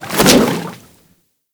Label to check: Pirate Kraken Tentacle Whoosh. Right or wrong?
Right